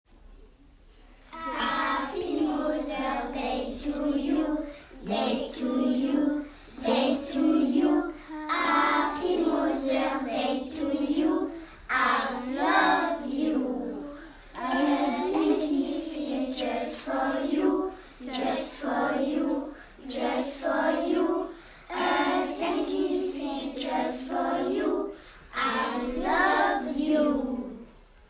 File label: happy mothers day classe, ecole maternelle